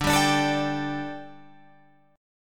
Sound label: D Suspended 4th D, Guitar, Open F; Dsus4; strummed